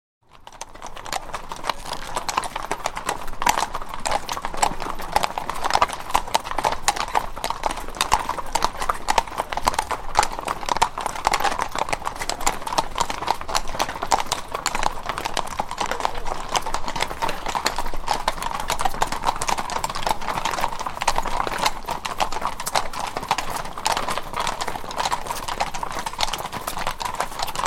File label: Cavalry Walk Constant On Cobble; Historical; Hollywood Edge Historical Series; War Horses Armies & Weapons